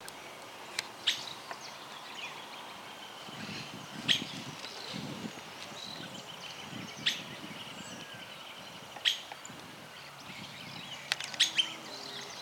benteveo rayado voz, aves

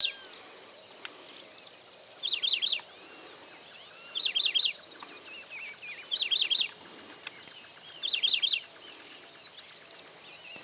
Pepitero Chico Voz, aves

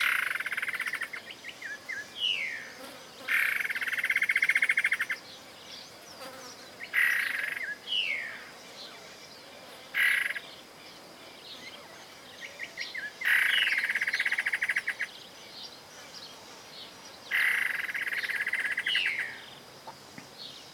Chororó Macho enojado, aves; Esperanza

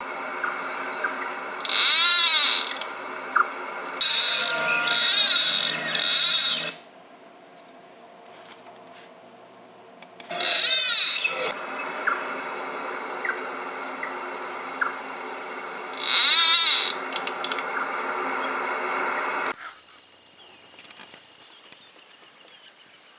cortarramas voz; aves

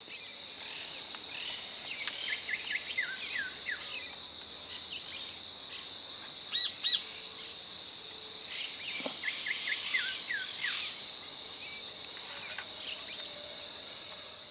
Pepitero Gris Voz; aves

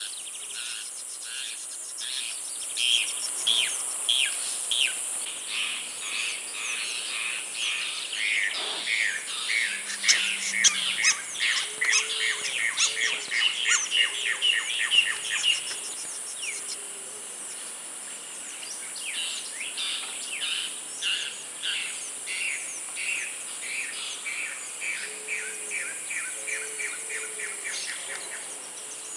cacholote castaño voz; aves

